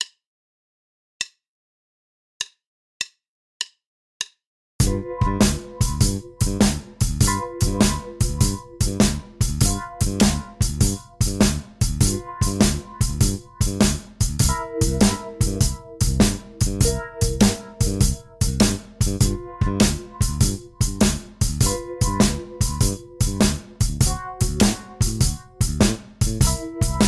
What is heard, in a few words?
12 Bar Blues In G w Bass